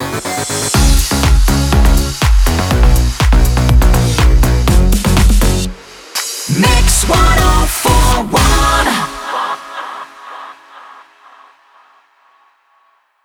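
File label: 122bpm MIX Ramp, jingle; WBMX 2017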